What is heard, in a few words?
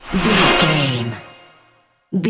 magic shine light spell